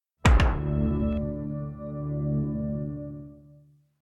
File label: intro sound effect; netflix